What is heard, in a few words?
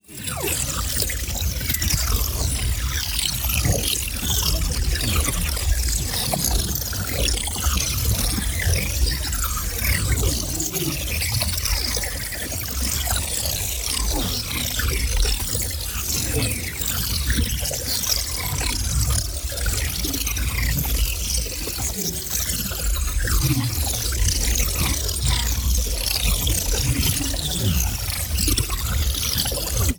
PARTICLES LARGE FAST Drift; NEON; boom library